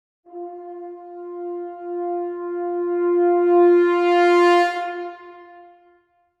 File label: Horn Swell